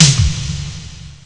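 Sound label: fog snare drum; jazz jackrabbit 1; M8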